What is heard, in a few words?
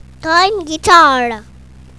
guitar